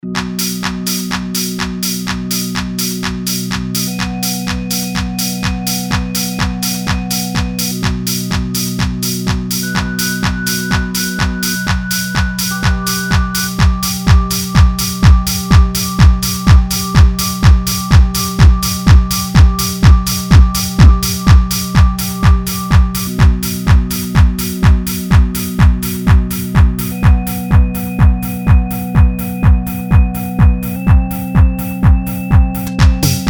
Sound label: disco